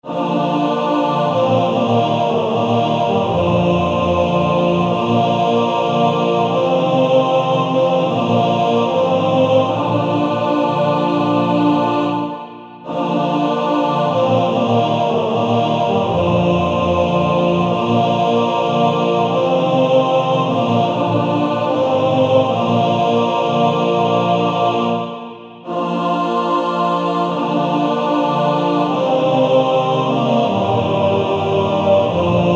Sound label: Male Choir TTBB; Pass me Not O gentle Savior